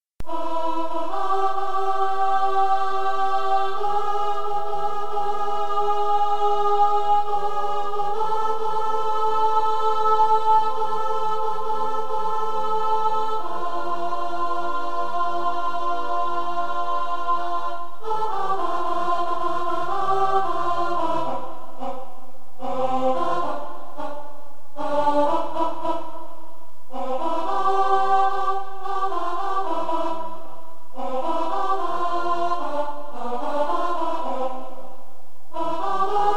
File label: Alto